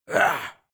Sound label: male fight feral; schreie; menschen; soundpool